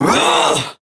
Punch, Barks; Kuva Lich; Persona C; Ability

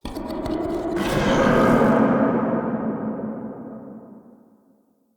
Corrupted Ambient Roar; Vocals; Bromius; Animals

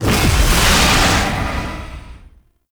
Corruption Pool Burst; Enemies; Abilities; Warframe; Zariman; Void Angel

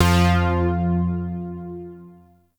Shawzin Oct One F; Music; Warframe; Zariman

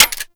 Pistol Mech, Weapons; Warframe; Grineer; Kohm

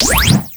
Fire; Lato Prime; Weapons

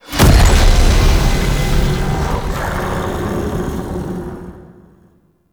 Alchemy Fully Filled Stinger; Entrati

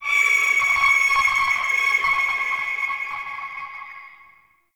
Tonal Nightmare, Ambience; Warframe; Shadowgrapher

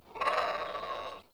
Bird Of Prey Vocal Perched Ground

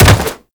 Jump Attack; Footsteps; WallRun; Warframe; Characters; Tenno

